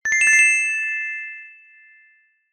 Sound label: fantasy magic chime ping wand fairy godmother